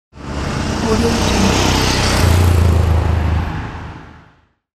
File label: motorcycle passing by